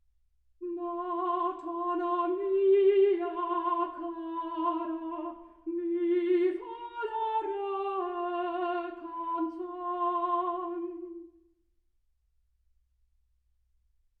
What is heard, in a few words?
soprano